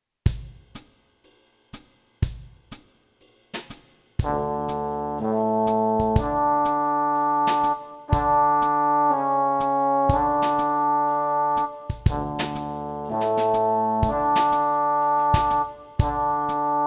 New notes F, brass

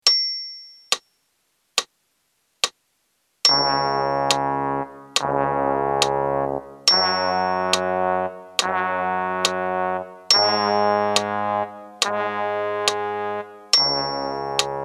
New notes in Eb, brass; backing tracks

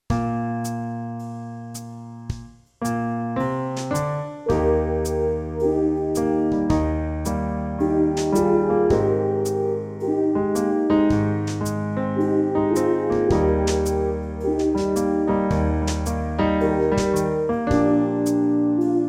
2 semi in Eb, brass

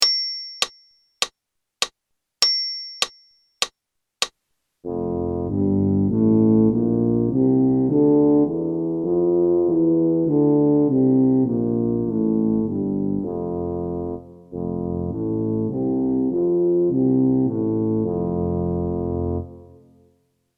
G major in Bb, F maj, count in, brass

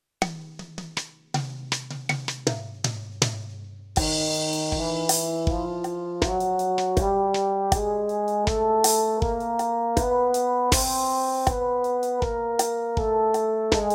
Chromatic in Eb, brass; backing tracks

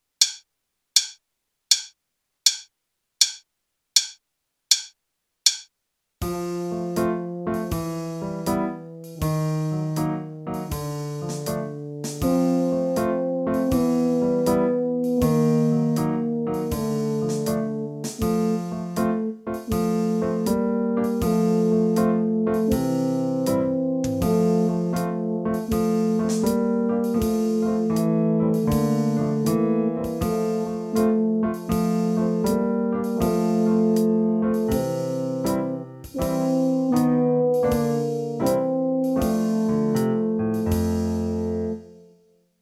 Slow; brass; backing tracks

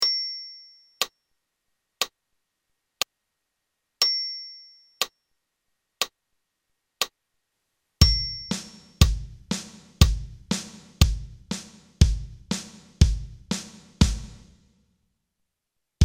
Percussion with count; Saints; brass; backing tracks

